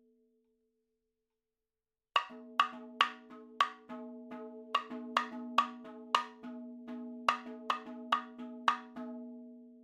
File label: 70 3 4 Mad Fills R, madhalam, percussion; Sample Banks; MADALAM 70 3 4 FILES